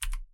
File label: type, keyboard